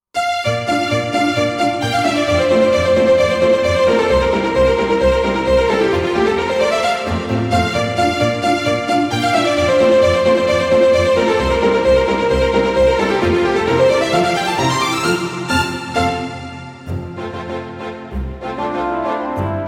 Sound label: neapolitan song trombone plus; album in trombone